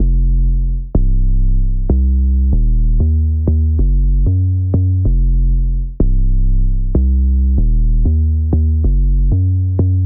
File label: Dancehall Afrobeats